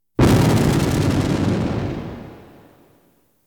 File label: Science Fiction effects, Lasers, Light beams